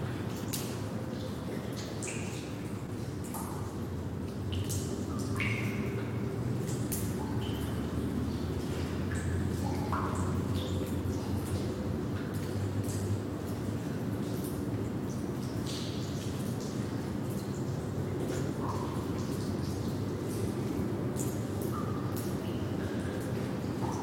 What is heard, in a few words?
amb sewer; midnight guns